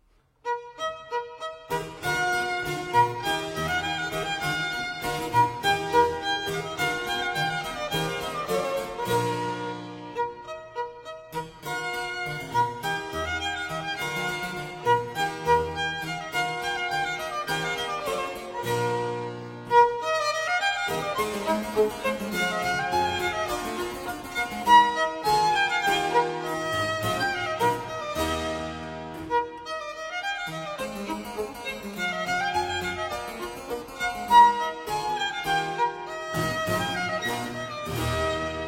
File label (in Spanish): gavotta violin; corelli